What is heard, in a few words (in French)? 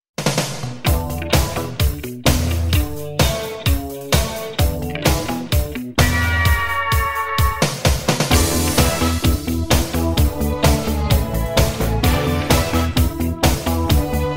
accords